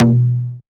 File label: Conga Low Drive; One Shots; Drums; Ableton Live 12 Lite; Core Library